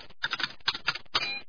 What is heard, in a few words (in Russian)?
zvuk rezkogo nazhatiya na klavishi